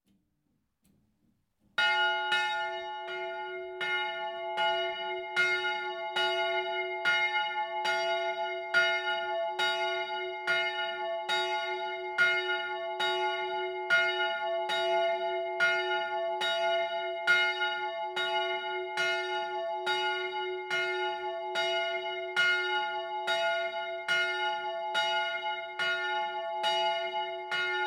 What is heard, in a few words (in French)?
cloche; Knutwil; campanologie; Willisau (LU); Assemblée générale 2013 de la GCCS à Willisau (LU)